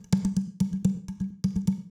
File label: bottle, ethno, 125